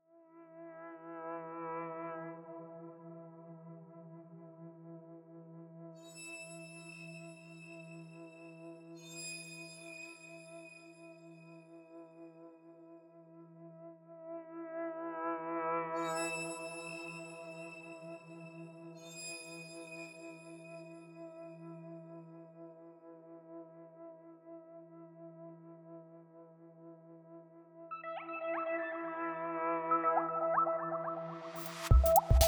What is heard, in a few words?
Electronica Soundscape